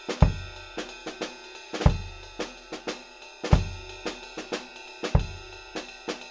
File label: drums